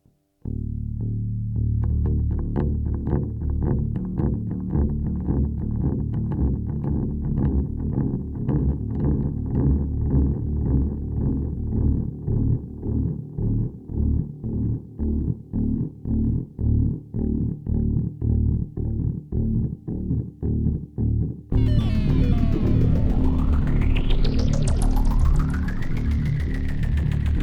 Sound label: live lead